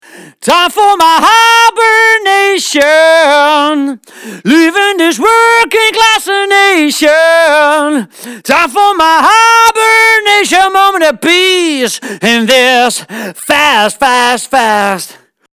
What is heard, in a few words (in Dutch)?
Zang Digitale distortion